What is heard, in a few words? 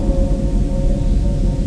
alien harmonics